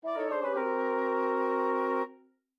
Jingle Negative; nekocharm

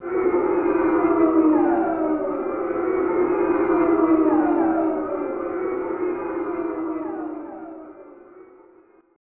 Crowd Booing; ABC Monday Night Football; SNES

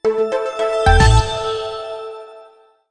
jingles Game complete; Smart As...; PS Vita; Music Sharedjingles - Gamecomplete1

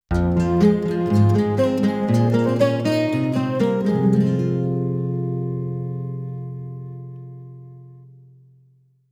Jingle Soft Court; Curse of Atlantis: Thorgal's Quest

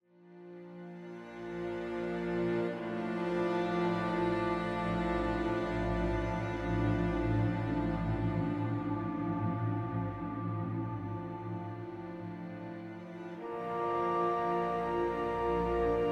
Explore Day Acoustic

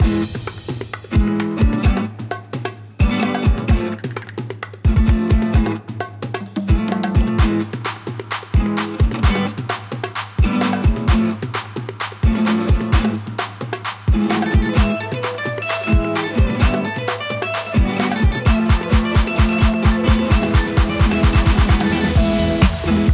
Dance; WrestleQuest

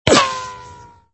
Ricochet

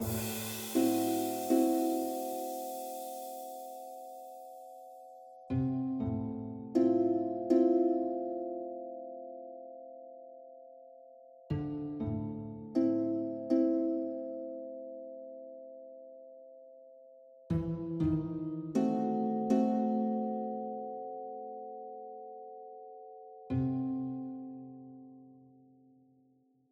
Workshop Harp

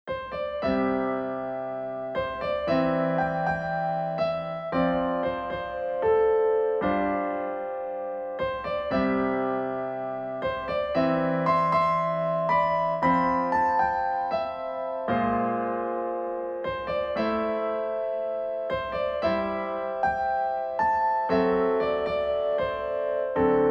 Frozen piano; Gore Screaming Show

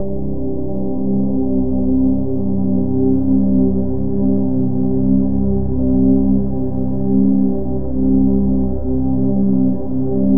Downtown Overlay Drone

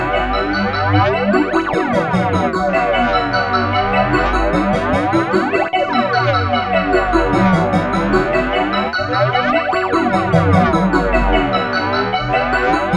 spiraling loop; Little Bug; Xbox Series X/S

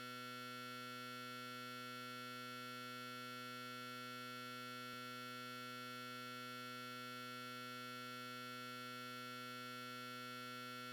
light fluorescent

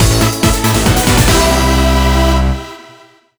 Jingle; Alice Gear Aegis; Android

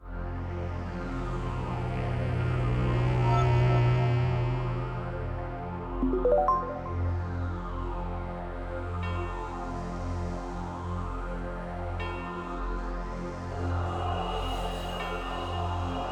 Ambient; End Less Ops

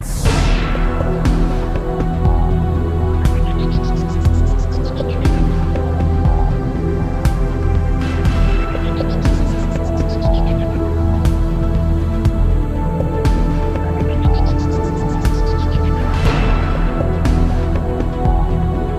Open Case Bed c key high; Deal or No Deal; Wii

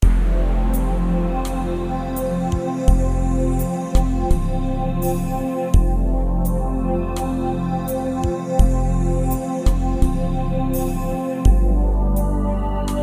Calming New Age Music

